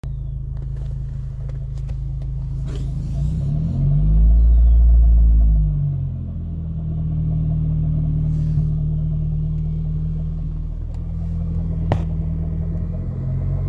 new engine noise sounds like horse galloping, engine noise; 2nd generation ge 08-13